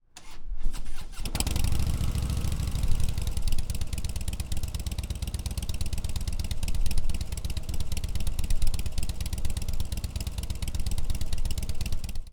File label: Softail 103 STOCK Idle; Exhaust Sound - Stock Idle; Rinehart's 2-into-1 exhaust system